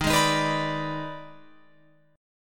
D sharp Major 7th Suspended 4th Sharp 5th, down Guitar Open F; D#M7sus4#5; strummed; chord shape